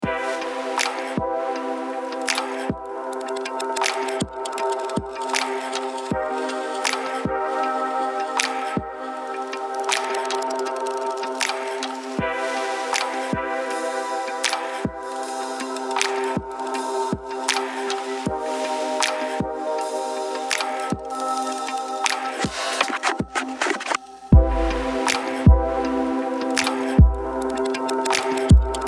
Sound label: Urban Waves reduced